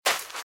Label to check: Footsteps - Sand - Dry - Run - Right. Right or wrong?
Right